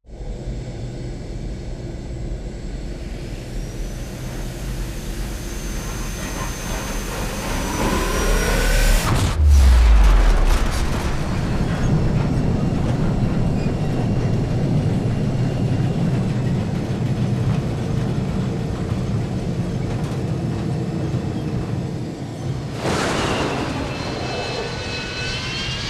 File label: dropship; Titanfall 2